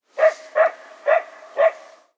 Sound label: Ambience Menu Dog Barking; Subway Surfers City; Android, iOS